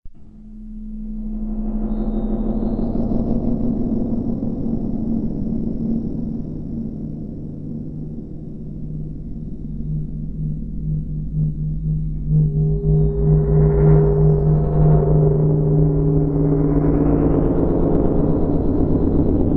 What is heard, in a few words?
Song Ambience; Teslapunk